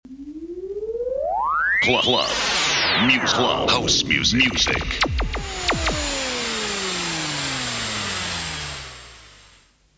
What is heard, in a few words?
House Music, Jingles; Club Music